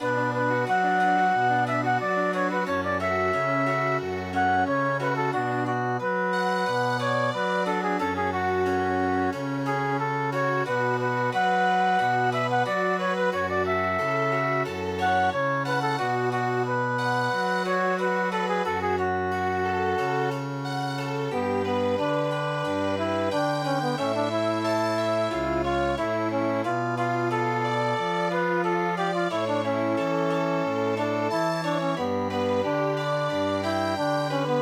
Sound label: Chamber Music; POLOVETZIAN DANCES